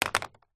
household remote control tv plastic set down wood table; zapsplat